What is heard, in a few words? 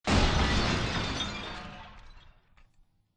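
explosion mid distance debris; corporate; Titanfall 2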